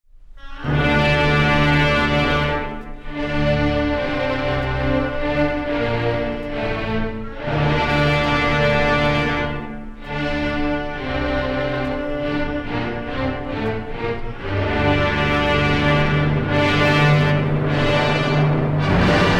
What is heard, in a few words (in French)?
Symphonie en ré mineur Grave Allegro non troppo; Rachmaninov; op. 13